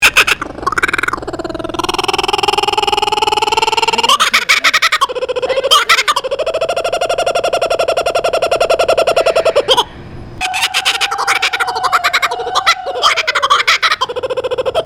suara tertawa burung kookaburra di kebun binatang cincinnati

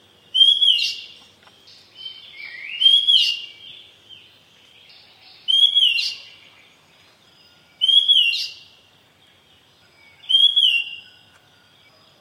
pelanduk topi hitam

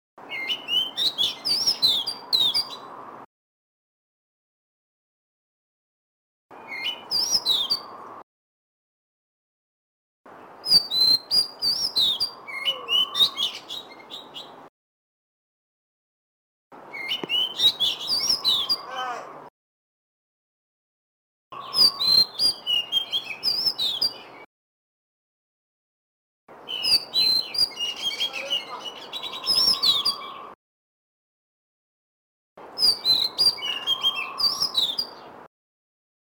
suara kicauan kacer lutung cebu

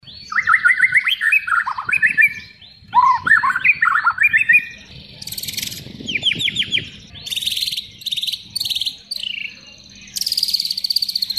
murai batu isian kenari

